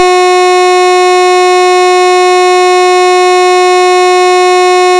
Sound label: buzzer tone